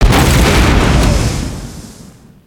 ship explosion; destructibles

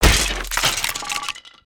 on death, creatures organic

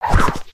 Rocket Land; cinematics

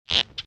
arm on, emotes; MF Volunteer

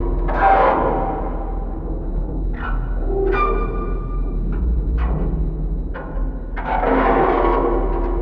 bunker creep, ambience; ambience avant gardens

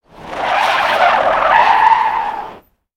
SKID swerve, vehicles

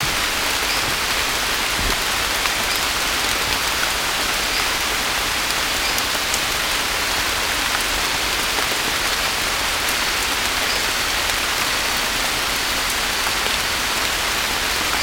Rain, ambience gnarled forest

